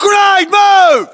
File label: grenade; fgrunt